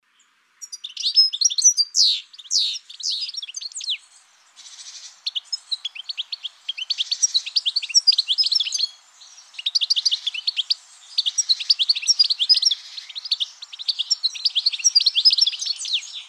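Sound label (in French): Chardonneret elegant, les oiseaux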